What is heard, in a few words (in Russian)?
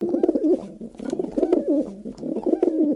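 sound of a pigeon, pigeon cooing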